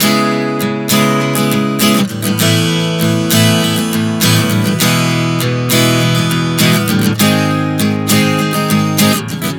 Progression 100 C#m-A-E-G#, Acoustic Guitar, Chord Progressions 100 bpm